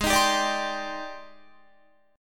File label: Ab Major Sharp 11th Ab down Guitar; AbM#11 strummed; JGuitar